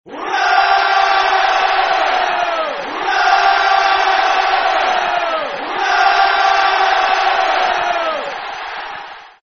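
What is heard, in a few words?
sounds and shouts of hurray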